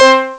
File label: Cord Piano, Amiga Soundtracker; ST-XX Amiga Soundtracker Sample Packs